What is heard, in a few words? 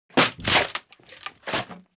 cracks thin wood; fights bodyfalls; Asian Show Sound Effects